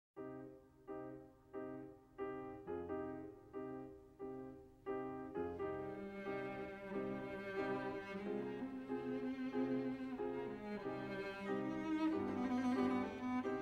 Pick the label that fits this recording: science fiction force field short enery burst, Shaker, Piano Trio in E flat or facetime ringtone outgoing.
Piano Trio in E flat